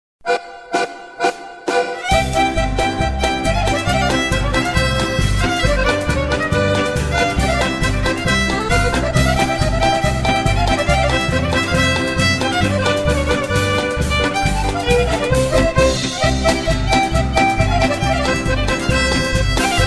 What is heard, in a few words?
Helena Polka